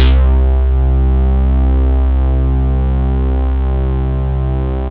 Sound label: SYN BASS